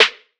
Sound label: snare bud; one shots; SEISMIC Drum Sample Pack; Slate